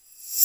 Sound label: REV Tamb, Roland JV-2080